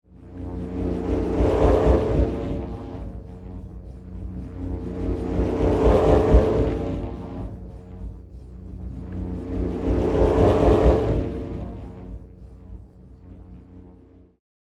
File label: Wind Wand